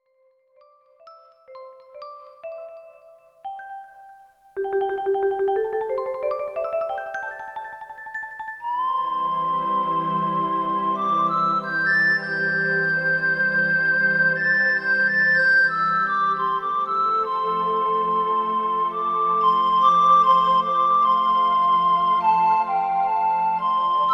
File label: backingtrack